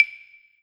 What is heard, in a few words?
Korg TR Rack Percussion Kit Wood Block